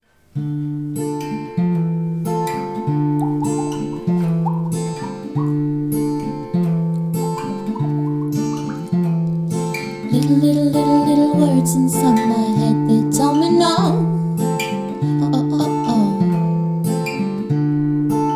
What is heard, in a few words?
Bird Song